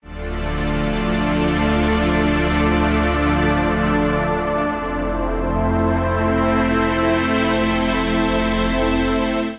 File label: Pad (Dm) 118bpm; Music Loops; Gracious Fields; Ableton; Singularities